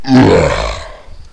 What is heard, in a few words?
Hunter Death; cstrike; zombie plague